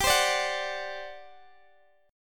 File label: G Major 7th Suspended 4th, Guitar Standard, GM7sus4 chord; GM7sus4 strummed; JGuitar